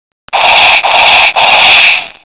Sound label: convoy cannon; God Fire Convoy; cannon fire sound effect